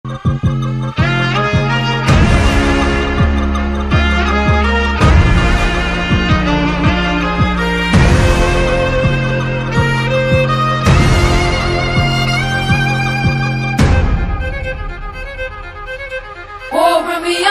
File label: teaser bgm; kalki; prabhas; nag ashwin